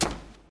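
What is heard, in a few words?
footsteps shuffling on stone right; The Wild Thornberrys Movie; lepes csoszogaskovon jobb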